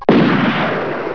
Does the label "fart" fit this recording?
No